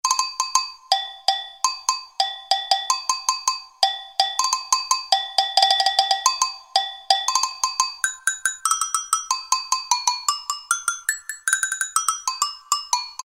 AGOGO, Roland SN-U110-02 Latin And F.X. Percussions